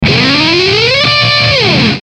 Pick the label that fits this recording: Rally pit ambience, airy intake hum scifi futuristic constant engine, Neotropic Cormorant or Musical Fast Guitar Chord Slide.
Musical Fast Guitar Chord Slide